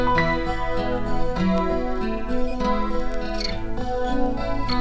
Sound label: guitar, Loops, Phonky